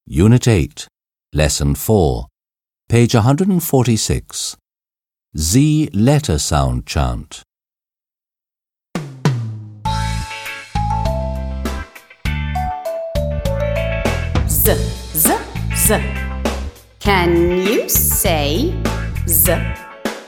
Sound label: Z Letter Sound Chant